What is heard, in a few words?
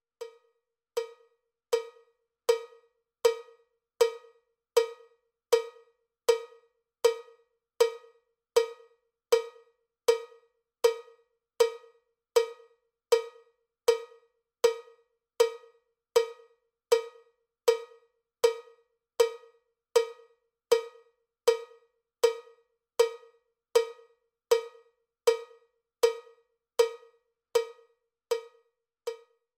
cowbell click track bpm 79; drumtex; cowbell click tracks vol 1